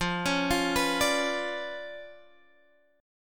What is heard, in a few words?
C sharp 7th Flat 9th, chord